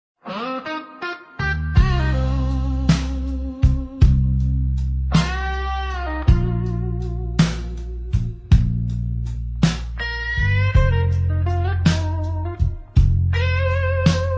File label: Blues Rock